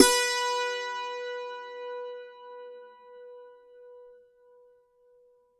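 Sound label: B4, dulcimer beat; SoundBytes; Early Patches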